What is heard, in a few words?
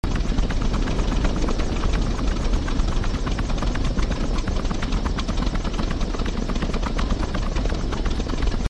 Airship Loop